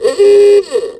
Horn Upgrade in; AOS RF1 Kart Demo

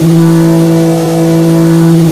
V6T on low; AOS F1 2022

